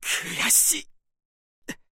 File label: die